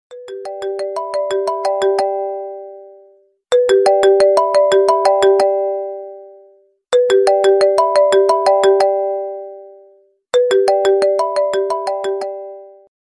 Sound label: Mobile Ringtone